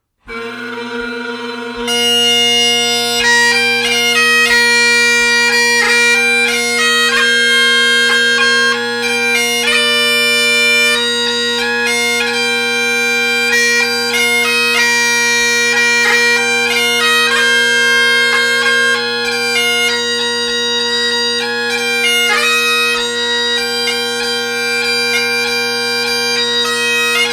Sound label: Jingle Bells, Bagpiper; Halifax Bagpiper